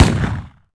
shot; Shadowman; weapons; mp5

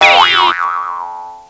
SPRING

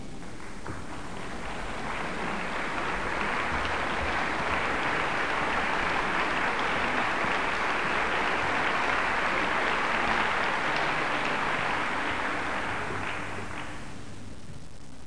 applause

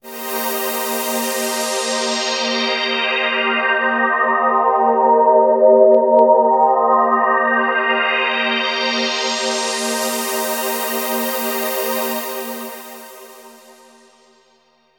SYNTH PAD AMBNT 160 C, Ambient; eJay; Sphere

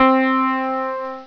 Piano Short; Number Munchers

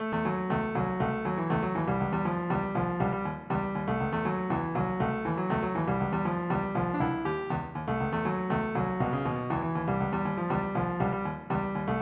Funk

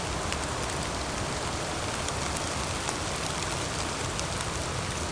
Rain-Spring; ImpGal2; groundwar

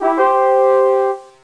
Attribute Increase; Death Blade

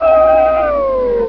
Downward Spiral; Ultima III

